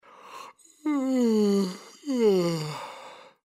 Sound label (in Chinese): 打哈欠困乏的声音